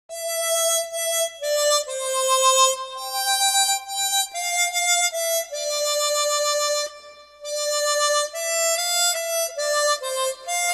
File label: Hymns On Chromatic Harmonica; Abide With Me